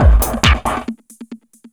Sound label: 138, Techno, drum loops